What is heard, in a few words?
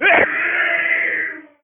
pain; svencoop; morass beta; armorman